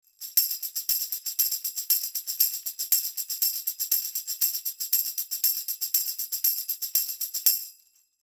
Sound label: tambourin close; Austrian Audio OC818